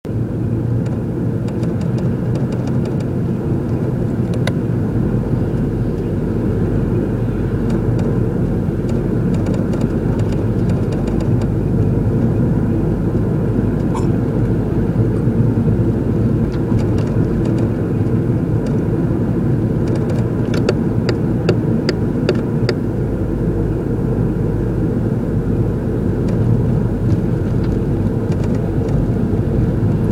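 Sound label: strange typewriting-like noise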